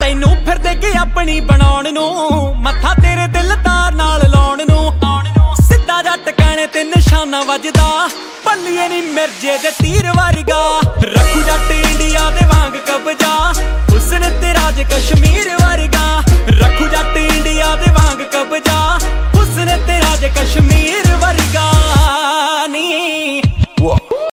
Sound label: DJ Punjabi Ringtone